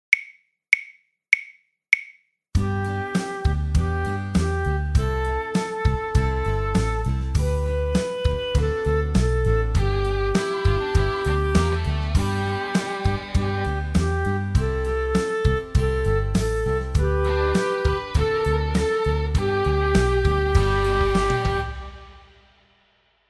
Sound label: Rock Duo Full Performance